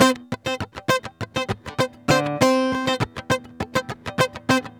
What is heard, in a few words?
GUITAR SAMBA